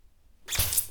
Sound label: Thwip; Spiderman